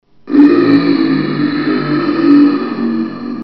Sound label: zombie; dod